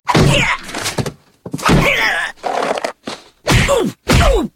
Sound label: martial arts